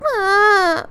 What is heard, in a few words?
Girl Whine